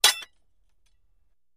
Axe Clinks, Dull; Light Fast Metal Clank Of Axes Scraping Together. - Metal Scrape